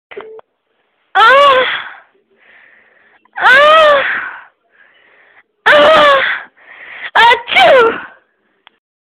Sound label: Funny Sneeze